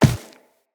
Walk Dirt, Footsteps; Operator

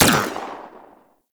Laser Fire; Warframe; Venus; Venus Terra Moa; Weapons

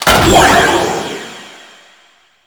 Magician Banish Ability; Warframe

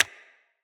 Claw Clack; Enemy; Combat; Characters; Corrupted Sproutfolk

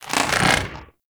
Head Pull; Warframe; Teralyst; Ropalolyst; Flight

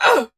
Pain, Battle; Warframe; Dax; DaxRanged; en

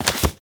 Putdown Folder; Warframe; Cephalon Weave

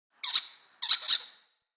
Squirrel Idle Chatter; Encounters; Animal Rescue

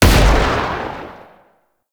Revolver Rifle Shot, Weapons; Warframe; Tenno; Mod Quest

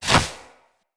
Punch Whoosh; Weapons; Unarmed; Fey

